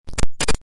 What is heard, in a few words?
Glitchy Zap Hit